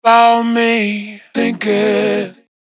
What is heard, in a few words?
Vocals Vocal Shine